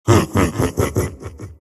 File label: laugh; dota; juggernaut